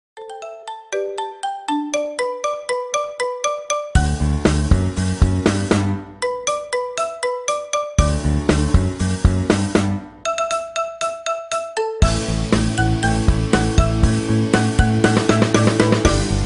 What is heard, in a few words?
marimba ringtone remix